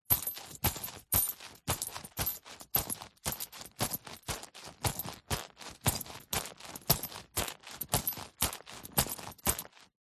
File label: foley luggage leather handbag walking movements